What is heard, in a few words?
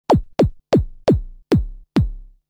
bd tweak